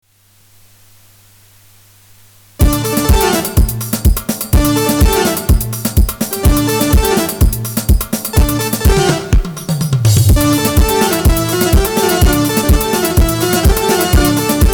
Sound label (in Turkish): hoptek, a300tr; a300tr demolarim